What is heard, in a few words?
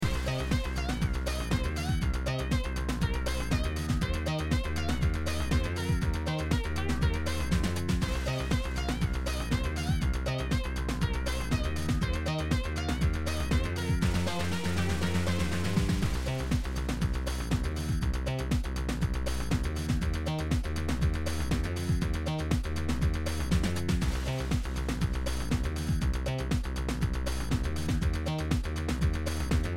bass basstone lead sound beat